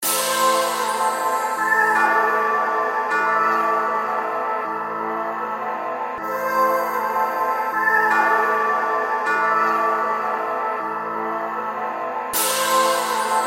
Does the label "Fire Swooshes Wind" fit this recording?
No